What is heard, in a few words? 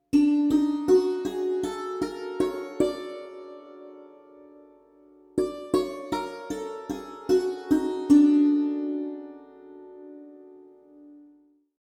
Basant; murchanas